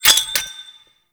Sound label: Grenade Pickup; Kahl; Warframe; Veilbreaker